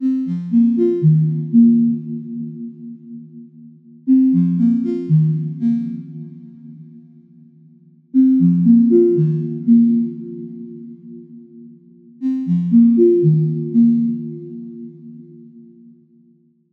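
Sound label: soft mind solo, hmd phone sounds; Стандартные рингтоны для звонка и будильника, звуки уведомлений смартфона HMD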